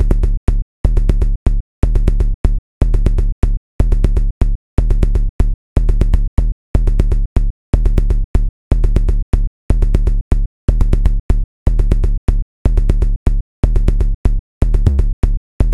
House Groovy Sub Kick and Bass Construction 122bpm